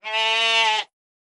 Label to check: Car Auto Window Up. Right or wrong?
Wrong